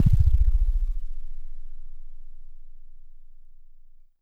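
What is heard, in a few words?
Mono Bass DX100 A#4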